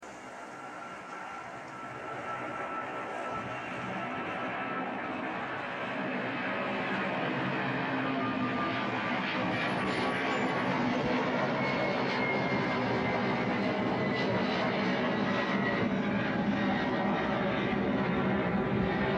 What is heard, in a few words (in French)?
Avion Boeing 767 décollage